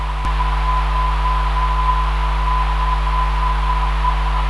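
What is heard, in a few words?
VH 409.5kHz, non-directional beacon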